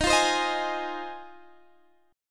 F Minor 7th, Eb

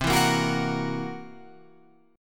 C 7th Flat 5th C down Guitar Open F; C7b5 strummed; JGuitar

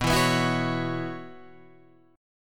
F sharp 7th Suspended 4th, guitar

